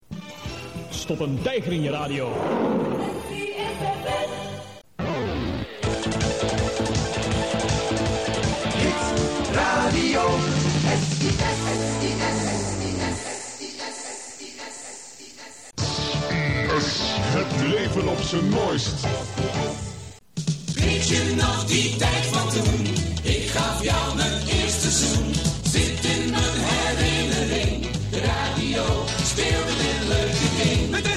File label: jingles; SIS